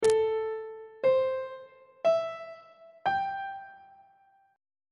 e sharp ionian 7th chord 3 is g double sharp minor 7th on treble clef